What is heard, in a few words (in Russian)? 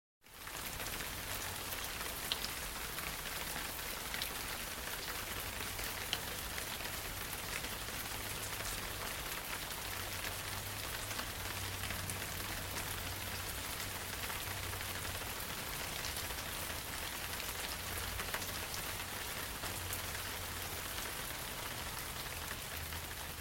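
моросящий дождь по листве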